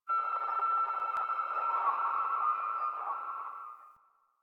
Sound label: Venus Landscape Distant Robot Call Low; Ambience; Warframe; Venus Open World